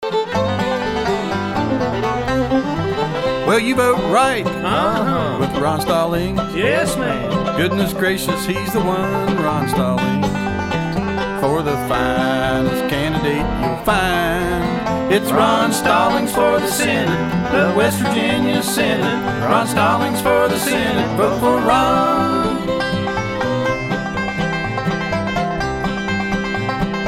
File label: bluegrass